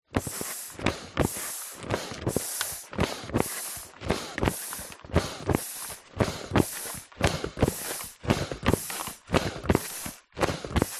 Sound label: industrial foot pump plastic pumping